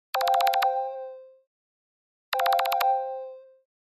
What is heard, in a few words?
Short Note, ringtones; Walton NEXG-N70